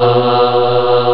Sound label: CHOIR E2; East West; Global Sounds